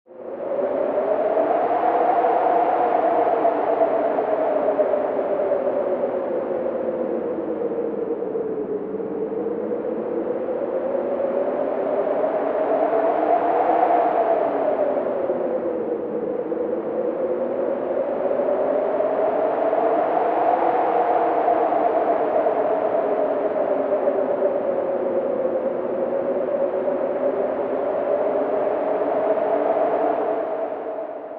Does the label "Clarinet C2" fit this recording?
No